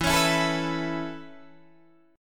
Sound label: A Major Add 9th E Guitar Standard, chord; Aadd9/E strummed; JGuitar